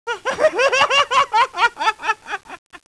laugh; Basara laughing; Samurai Shodown

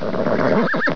Horse

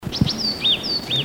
Yellow Tit; Macholophus holsti; song; Bird Call Recordings

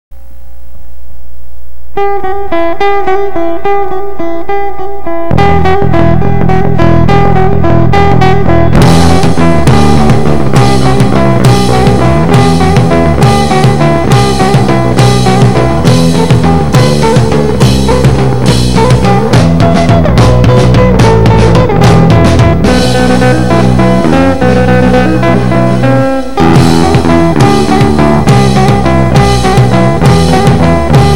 carol bells

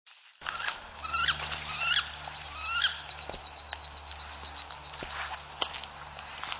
ducks wood

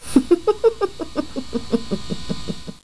giggle

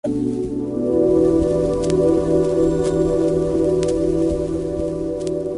melody

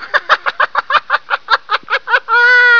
laugh

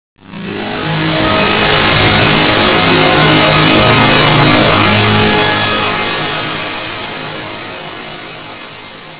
drone

